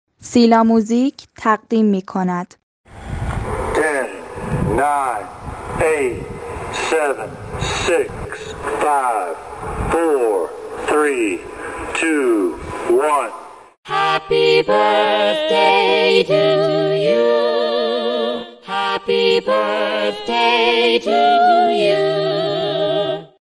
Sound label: happy birthday to you